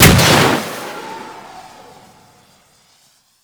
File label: Pet Rocket Fire; Alad V; Abilities